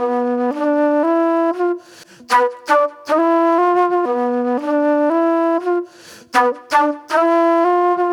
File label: Sweet Soul Flute